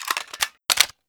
flare gun world reload; weapons; tf2classic

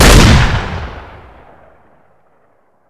long shotgun shoot; tf2classic

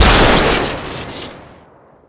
gold colt shoot crit, weapons; tf2classic

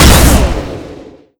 railgun shoot; tf2classic

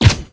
suppressed pistol; tf2classic

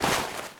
melee inspect movement, weapons; tf2classic

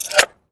insanerator tube close finish; weapons; tf2classic

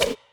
woosh; discipline device; tf2classic

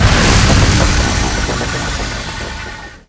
laika spin stop; tf2classic

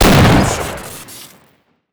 spas shoot crit; tf2classic

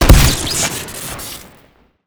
air burster shoot crit, weapons; tf2classic

